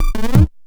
grind, EMU XL-7

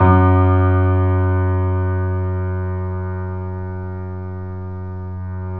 3D Pianos Yamaha C7; E-MU Producer Series Vol. 5

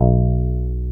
NASAL BAS, Electric Basses; PlatinumPhattCD2; E-MU Producer Series Vol. 8 – Platinum Phatt

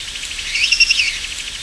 CRICKETS, JUNGLE; Spectrasonics; Heart of Africa 1; JUNGLE FX

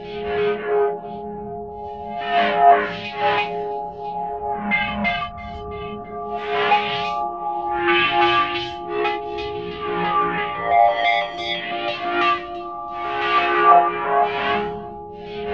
EERIE BELLS; Zero G; Malice In Wonderland

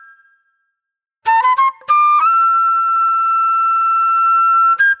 Flute

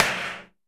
Ethno Techno slap; ILIO - Ethno Techno

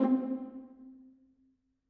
viola piz C4, Strings; BBC

